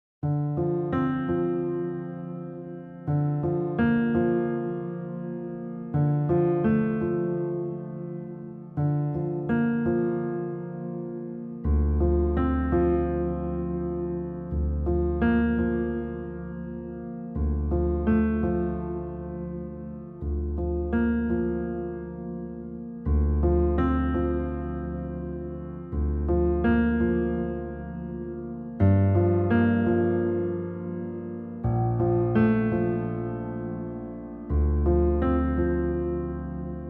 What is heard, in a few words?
piano set; Muzyka Ilustracyjna